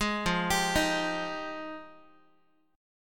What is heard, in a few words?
F Minor 9th F Guitar; arpeggiated; JGuitar